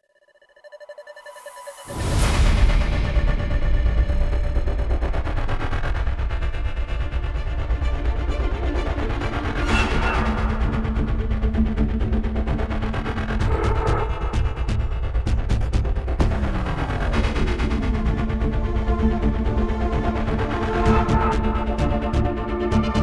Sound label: Extraction Failed; modern combat 5 blackout; android